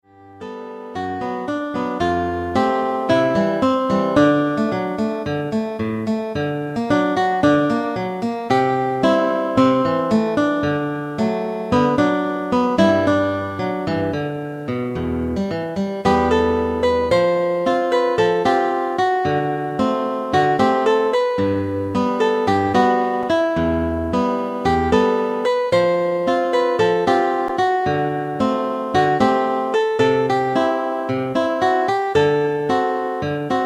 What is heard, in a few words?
synth